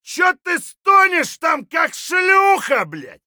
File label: fight; Escape from Tarkov